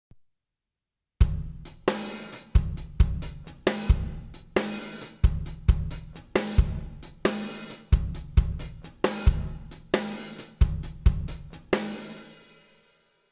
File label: A melo drum